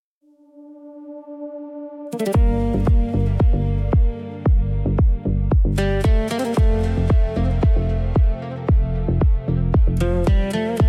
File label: watani